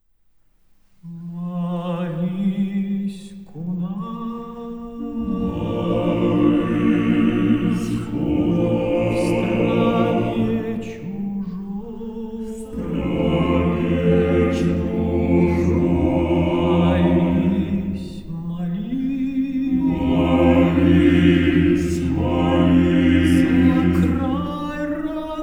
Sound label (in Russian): Хор